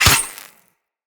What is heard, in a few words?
Melee Dagger Impact Robotic Flesh